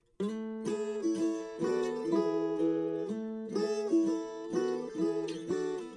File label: acoustic guitar octave up